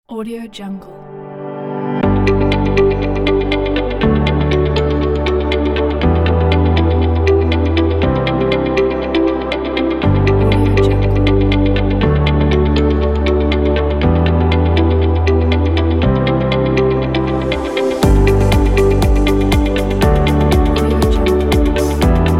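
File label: calm presentation kit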